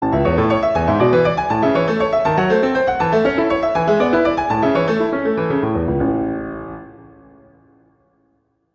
Prelude - A major; Clementi; Preludes

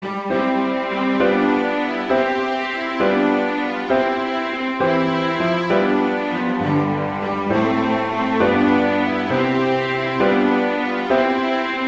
I Saw Three Ships; Christmas

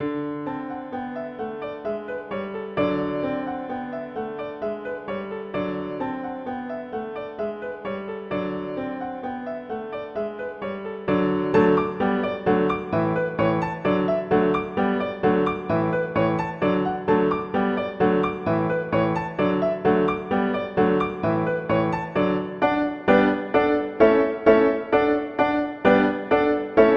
Russian Dance; Tchaikovsky

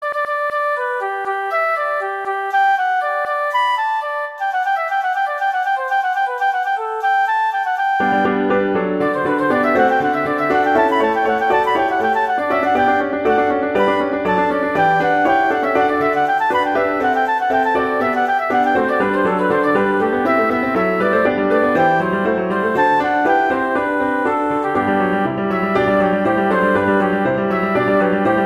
Allegro; Handel; Op1 No5

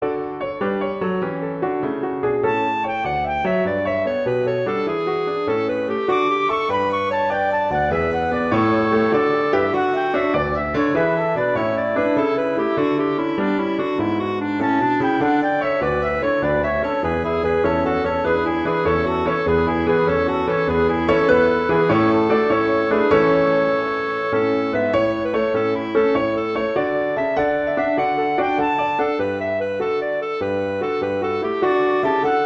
Gigue; Pachelbel; Canon; Winds

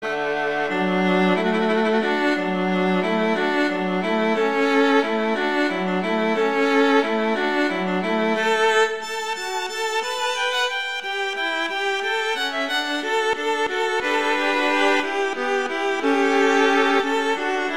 Alla hornpipe; Handel

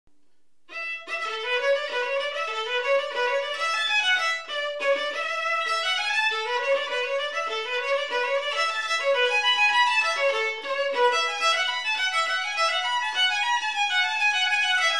Melody, Violin; Fitzpatrick; Violin Melodies

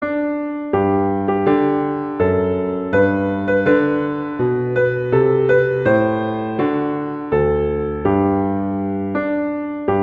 O Christmas Tree, Christmas Beginners

